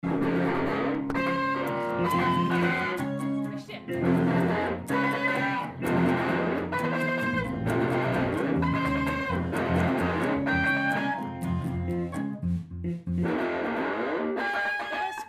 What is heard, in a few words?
riff; akusticky JICIN